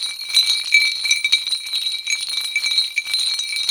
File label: METAL RATTLE, METAL PERC; Zero-G Ambient 1